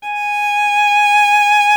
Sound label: VIOLIN G#5, SOLO STRINGS; Greytsounds VOL-1